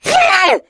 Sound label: pain; jockey